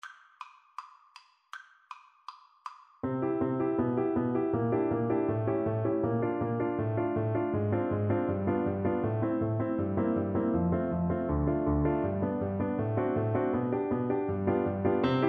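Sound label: recorder karaoke; Saint-Saëns, Camille - Carnival of the Animals - Finale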